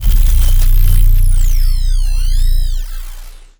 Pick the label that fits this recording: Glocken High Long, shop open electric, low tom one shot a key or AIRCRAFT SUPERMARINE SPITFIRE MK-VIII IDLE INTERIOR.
shop open electric